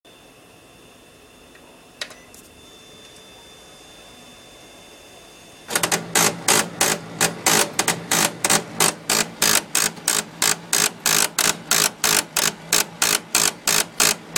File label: awkward noises